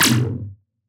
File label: weeee